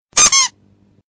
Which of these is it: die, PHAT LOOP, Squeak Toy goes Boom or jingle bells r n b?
Squeak Toy goes Boom